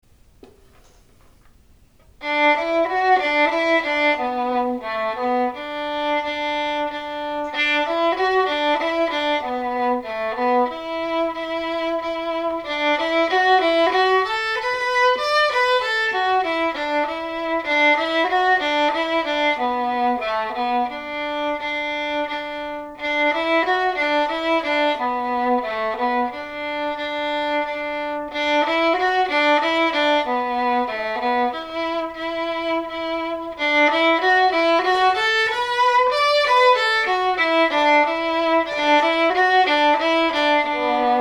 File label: viola old poor woman in d